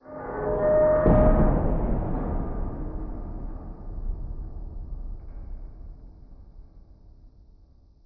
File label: structural failure distant